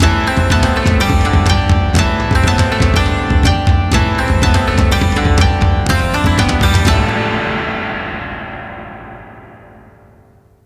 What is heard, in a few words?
Game Over; Piston Hondo